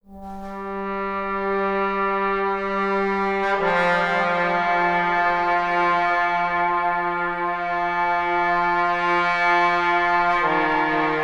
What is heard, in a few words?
Trombone - Cm 70Bpm; Construction Kits; STEMS; Ghosthack; SkyRealm; Kit 19 - Ode To Daenerys